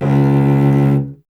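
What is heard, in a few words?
strings cello C#; Miroslav Vitous; Solo Instruments VOL-1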